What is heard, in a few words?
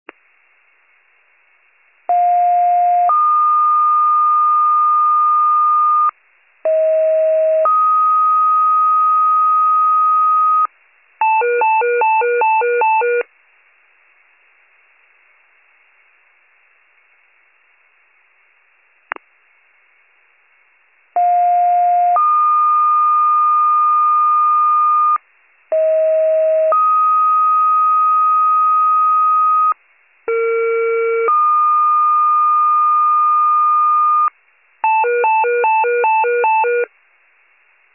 FIRE pager alert